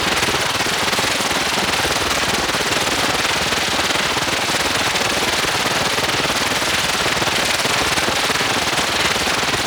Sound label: Exhaust Trail Loop; Archwing